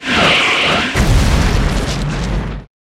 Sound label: attack; Selupan